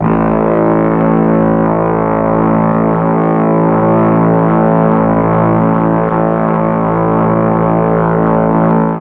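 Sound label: A1 half speed brass, mellotron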